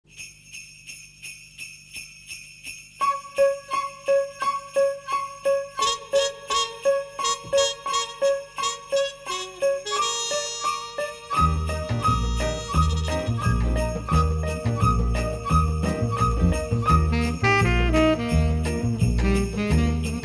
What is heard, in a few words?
christmas loop; Rosegarden